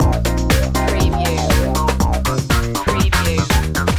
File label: fonk loop key fill